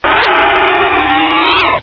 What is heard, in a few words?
houndeye pain; svencoop